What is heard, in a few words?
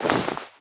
foley step; npc